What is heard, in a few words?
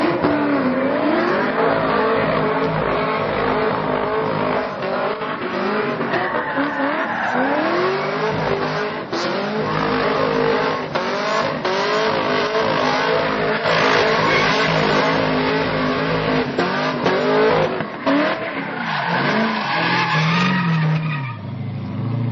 Tire; Ls3